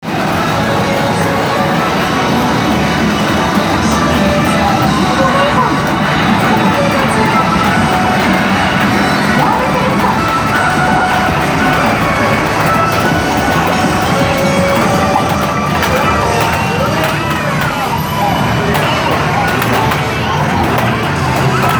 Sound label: arcade hiroshima